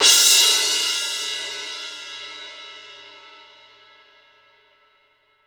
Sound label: C18 FF, orchestra hits; Best Service; Peter Siedlaczek's Advanced Orchestra